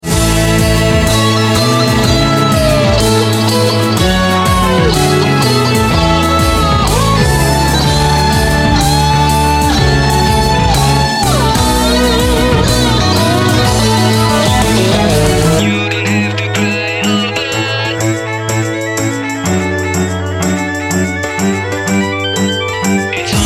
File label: Mono Gt